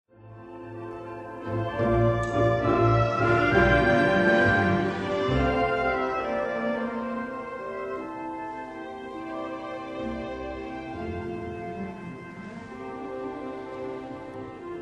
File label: Overture for orchestra in E major; Fair Melusina; Op. 32